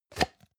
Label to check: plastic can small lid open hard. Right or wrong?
Right